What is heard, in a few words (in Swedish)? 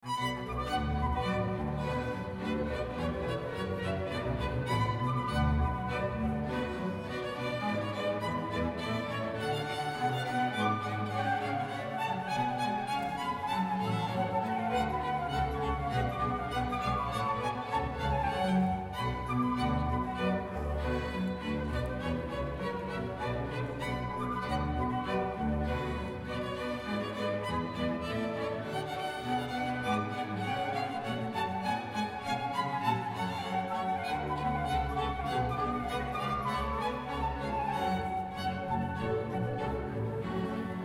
Bach H-moll Svit Badinerie